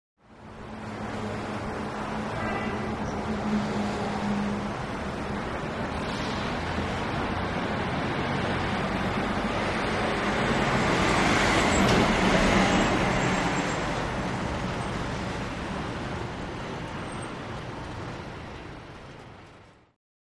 Large Truck By Medium

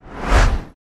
Car Oncoming By Whoo

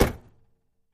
Camaro Door Close, chevy camaro

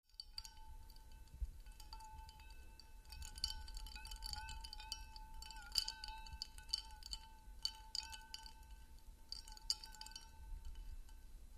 Cocktail Swirls Ice C, eating

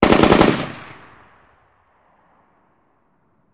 Machine Gun Sngl Burst; bursts

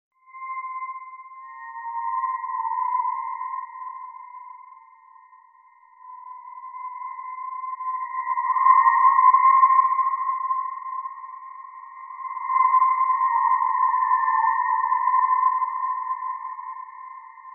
Eerie Tone Musical; eerie ambience

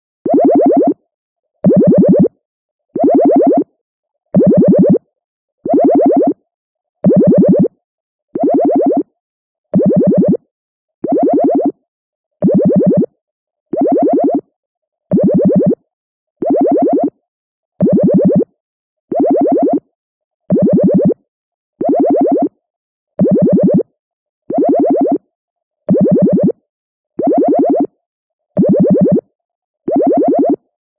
Bubbles Two Tone Elec, beeps & tones

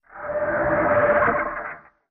Metal Nightmare Scrape, scrapes

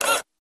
Door Outer Open, Damper, Partial; dat machine

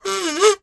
Kazoo Various Vocal

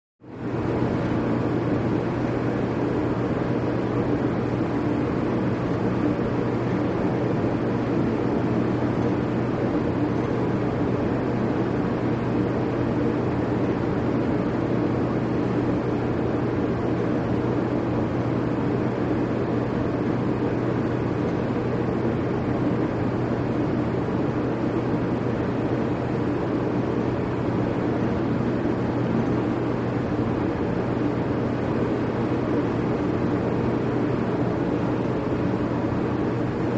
Ferry Boat Cruise

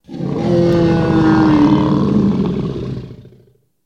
Dinosaur Roar Moan

